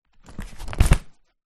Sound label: suitcase lid close; foley